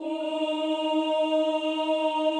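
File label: choir E flat 3, women; Sound & Vision - Gigapack I CD 2 (Roland)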